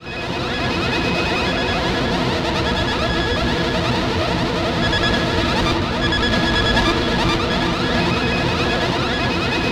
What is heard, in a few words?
ATM SYNTH; Best Service - XXL Production Effects